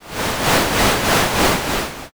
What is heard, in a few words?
murmur